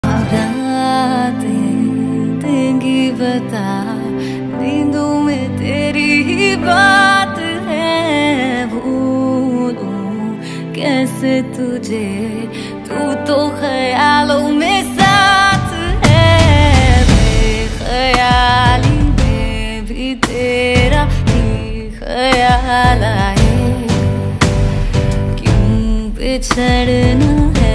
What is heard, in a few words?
Acoustic hindi ringtone